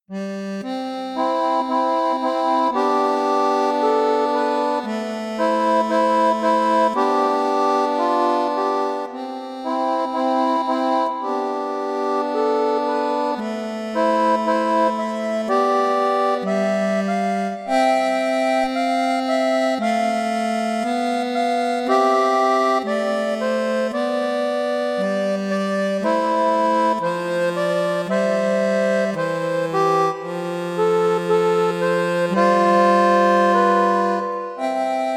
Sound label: Prelude and Fugue